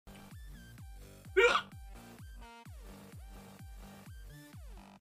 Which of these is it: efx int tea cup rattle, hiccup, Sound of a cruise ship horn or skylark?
hiccup